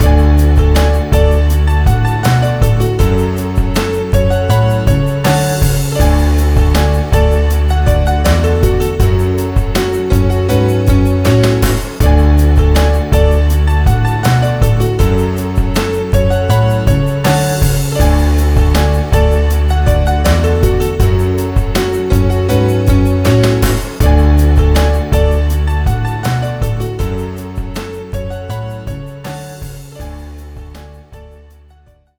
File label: Break